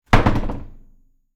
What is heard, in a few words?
Trap door closing